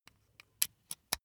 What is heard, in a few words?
insert usb cable slow